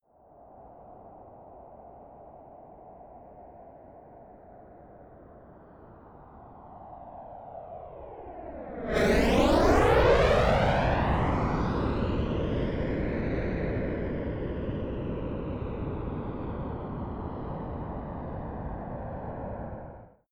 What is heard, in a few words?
military jet f-22 raptor flyby fast close